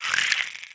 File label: relax, clams; infestation